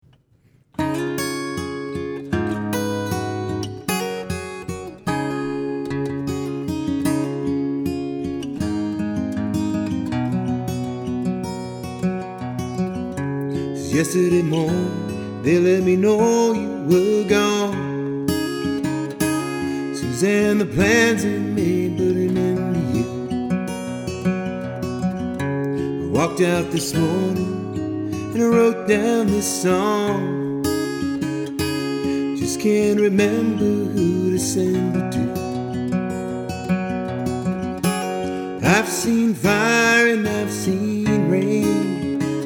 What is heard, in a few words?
-, acoustic guitar